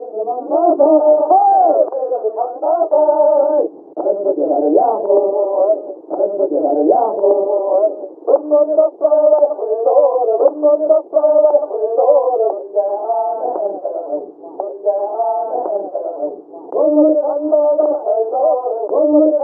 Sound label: Japanese Pub; Black & White 2